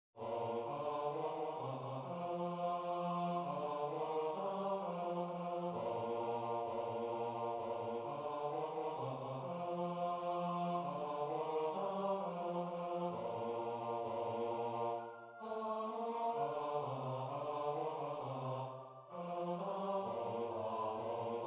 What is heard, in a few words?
bass; Ding Dong